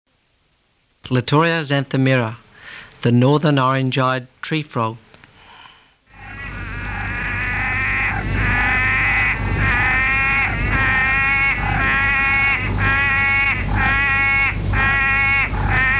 Litoria xanthomera

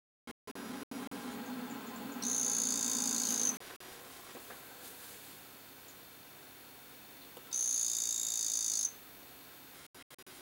Andinobates claudiae

